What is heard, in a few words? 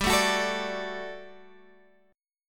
G Major 7th Suspended 2nd, Guitar, Open G; 17,14,16,14,15,x; down